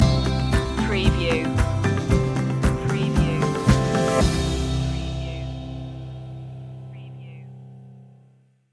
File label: turn that frown upside down stinger